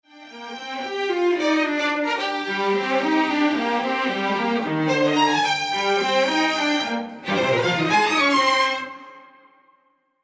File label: Imager Non Effect